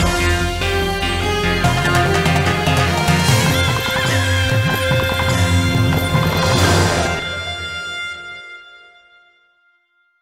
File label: Commercial Out; Malayalam Ver; Millionaire Soundtrack; Indian Soundtrack [2011 - now]